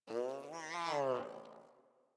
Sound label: Catbrow Kitten Yawn; Warframe